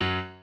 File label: piano